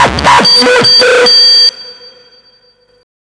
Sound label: CYBERGUN L, Hard & Loud Techno